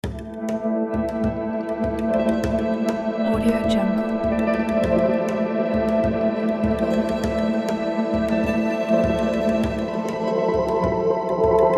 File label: soundscapes female vocal